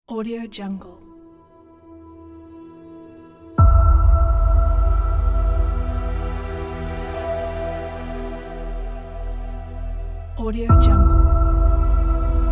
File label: epic